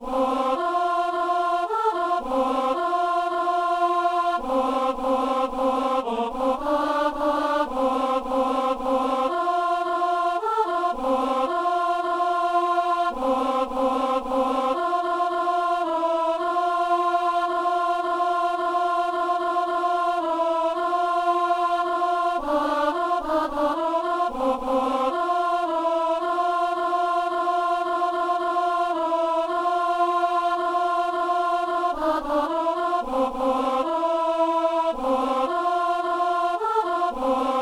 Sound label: alto; Il est né, le divin enfant